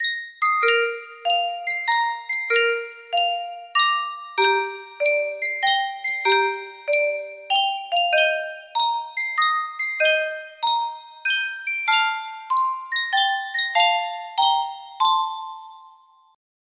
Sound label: yunsheng custom tune music box